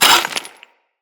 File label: Melee Impact Cement